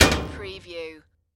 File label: Washing Machine Door; Sound Effects Bible